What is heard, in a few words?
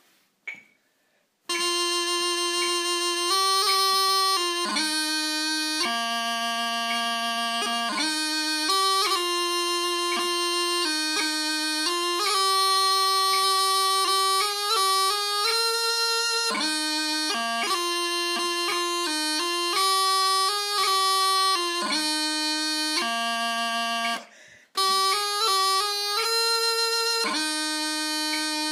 56 BPM, pipers